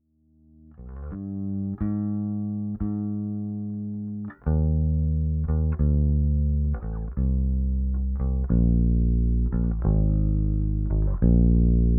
bass without; sonimus britson

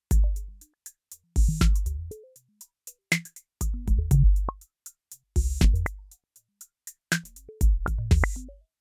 delay beat; aas objeq

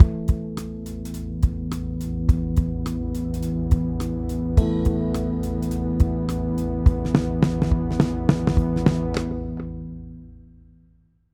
jingle mid side; fabfilter proq3

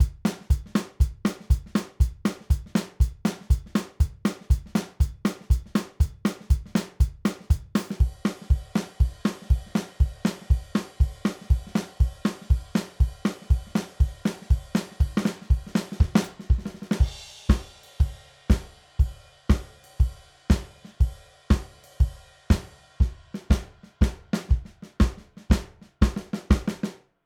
drums avec clean; psp e27

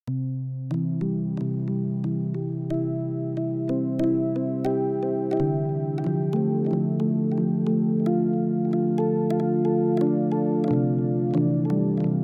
synth valhalladelay hifi

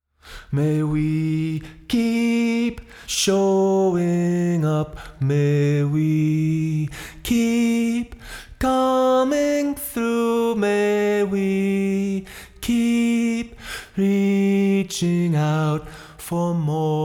vocals stereo room

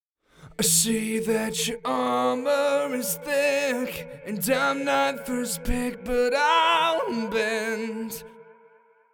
delay vocals; aas objeq

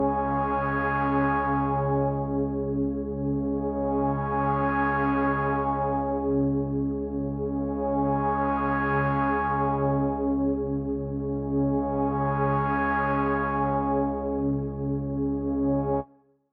pad naked; auburn sound renegate

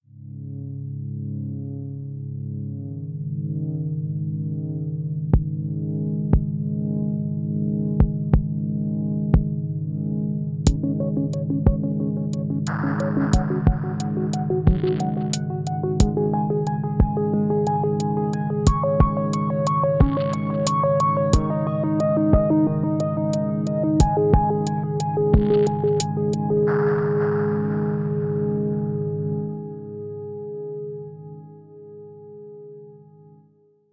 saturn warm tube

